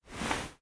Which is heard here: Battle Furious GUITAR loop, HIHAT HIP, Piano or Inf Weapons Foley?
Inf Weapons Foley